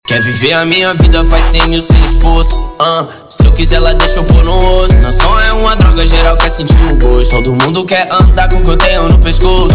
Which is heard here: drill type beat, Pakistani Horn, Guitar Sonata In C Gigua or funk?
funk